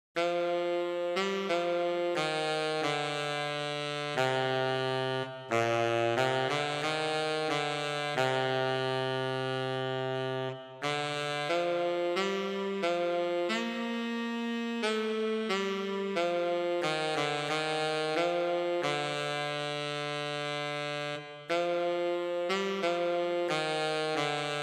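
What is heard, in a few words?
solo tenor saxophone; Alle Jahre wieder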